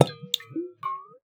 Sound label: water; Xsample VOL-9